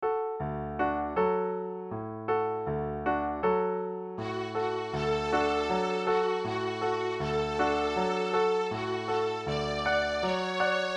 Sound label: brahms lullaby violin